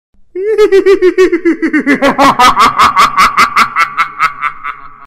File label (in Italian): risata malefica effetto sonoro evil laugh funny; risata malefica del grinch